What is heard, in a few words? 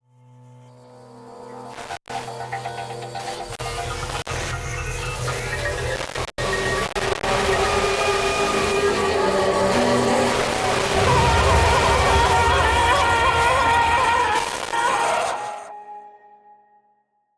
body discovery; drv3